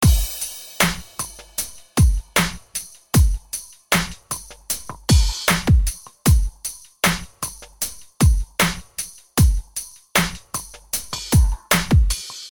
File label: Drumgroove dry